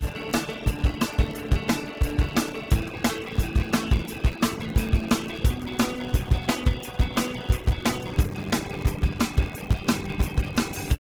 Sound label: groove hard